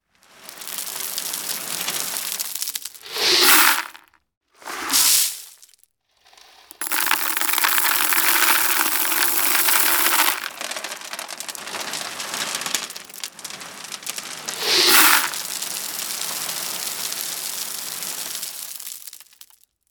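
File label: gravel pouring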